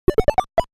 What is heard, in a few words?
Points Added; Balloon Fight; animal crossing full gamerip